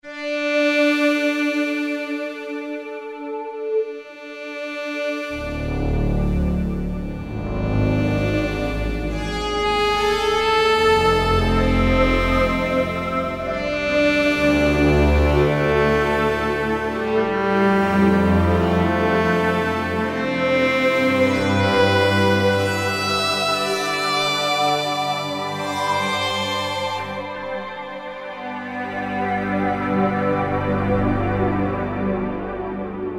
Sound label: Deep Atmospheres, Kurzweil K2000; Kurzweil K2000 V3